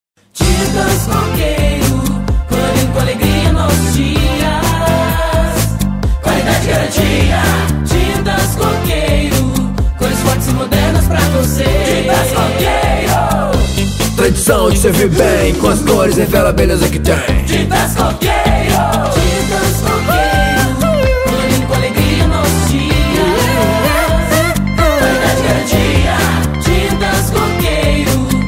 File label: jingle; coqueiro